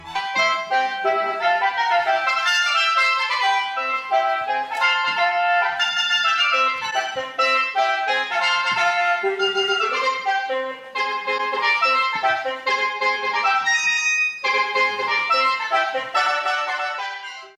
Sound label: Shō Instrumental Ringtone